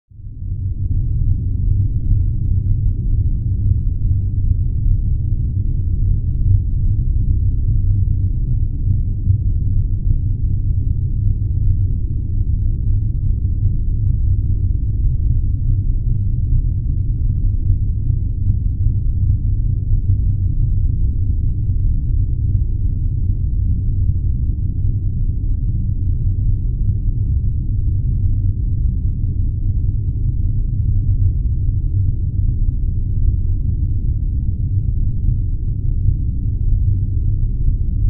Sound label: Hum, atmosphere of depth, water